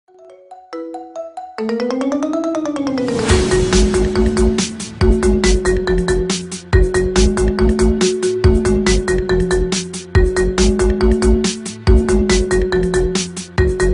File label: marimba ringtone remix